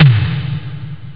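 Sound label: reverse tom; jazz jackrabbit 1; M8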